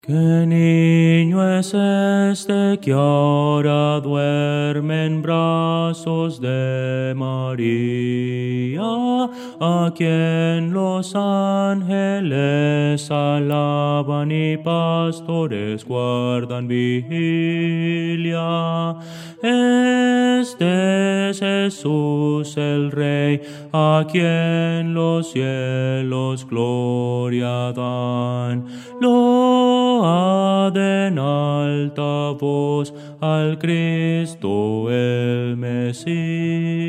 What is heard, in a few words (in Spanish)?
bajo, himnos cristianos; que nino es este